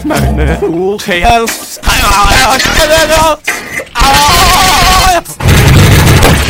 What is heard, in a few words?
scout laugh long